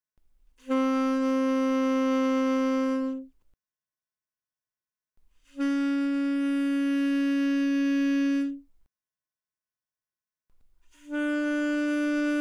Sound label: Alto Sax No Vib mf C4 B4, alto saxophone